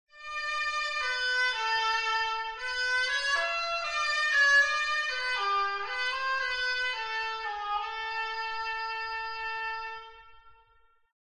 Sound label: shawm